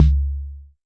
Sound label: Jomox MBrane, drum machines; Jomox MBrane 11